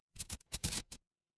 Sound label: office pen